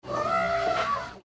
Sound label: trumpet